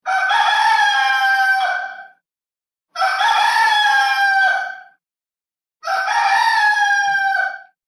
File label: Rooster Crows Three; Roosters; Animals